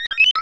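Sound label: sphere blips medium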